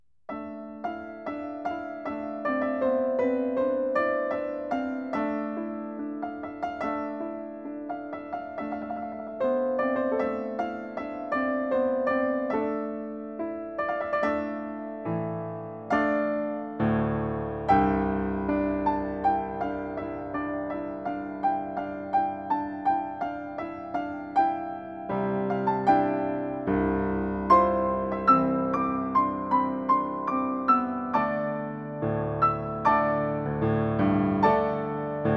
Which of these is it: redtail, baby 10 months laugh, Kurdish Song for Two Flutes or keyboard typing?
Kurdish Song for Two Flutes